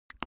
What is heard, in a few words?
teeth clack smack; soundpool